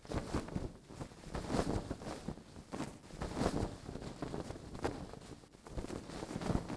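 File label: flapping Banner loop; Star Wars Obi Wan; XBOX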